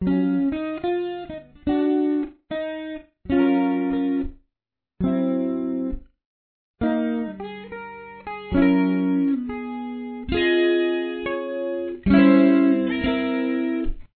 This challenging jazz riff requires precise note articulatio; This challenging jazz riff requires precise note articulation without lingering on any note too long.